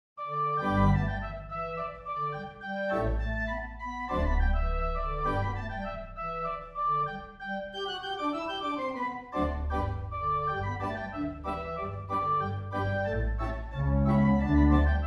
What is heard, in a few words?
Organteq; Arkansas Traveller